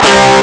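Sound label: guitar fuzz grosse; Amiga Soundtracker Sample Packs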